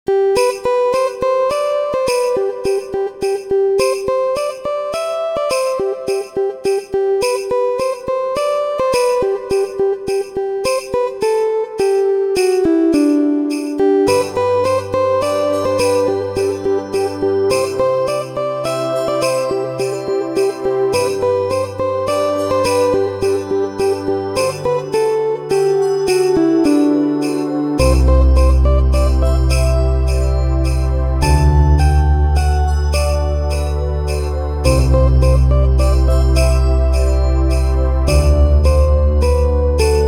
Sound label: Bells at Christmas